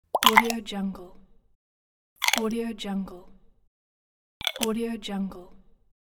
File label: mouth guard